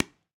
Pickelball Paddle Hit Ground Light, Ball Collision